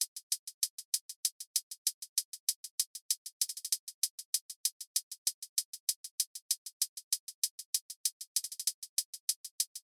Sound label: Drum Loop 97 BPM Hihat; Loop Stems; Cymatics; Vibes II Drum Loop Collection; Waves